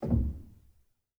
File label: rel, Grand Piano; bitKlavier Grand; Piano Bar